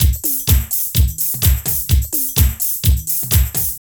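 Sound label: drum loops, right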